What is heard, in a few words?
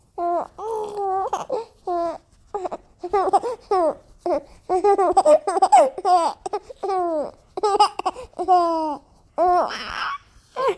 laughing